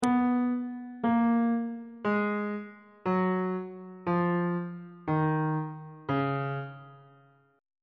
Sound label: d flat mixolydian mode descending